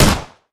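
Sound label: ar2 distant; weapons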